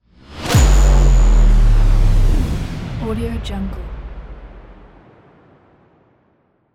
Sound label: cinematic impact